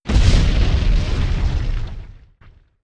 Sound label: nuke; SuperPower 2 Redux V7A